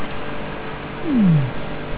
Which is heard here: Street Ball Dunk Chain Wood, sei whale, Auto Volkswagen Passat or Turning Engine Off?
sei whale